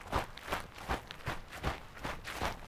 SFX PASO APRESURADOS TIERRA; yesterday origins gamerip 2016 switch ps4 pc ios macos

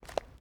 Paso sandalia piedra

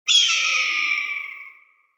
SFX HALCON GRAZNIDO

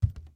Paso descalzo parquet

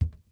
Paso descalzo parquet

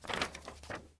MOV PAPELES; Yesterday Origins; ps4; macos

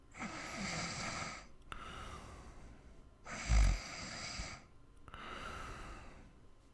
HOMBRE RONCANDO

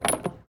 cadenas end

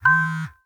BEEP MSG RECIBIDO; Yesterday Origins

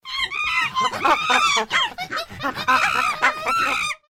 monos

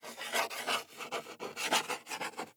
ESCRIBIR PLUMA

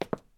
Zapato tacon madera; yesterday origins gamerip 2016 switch ps4 pc ios macos

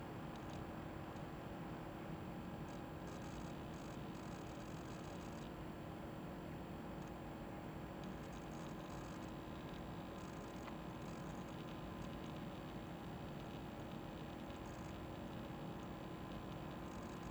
AMBIENTE ORDENADOR; Yesterday Origins

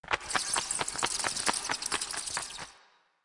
Ambiente galerias murcielagos

